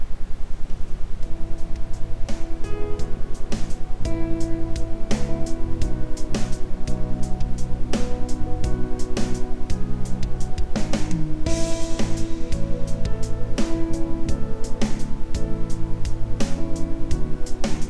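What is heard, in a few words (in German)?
Iso Gute Laune Gluecklich Quickie mit Ambient Sound, Isochrone Töne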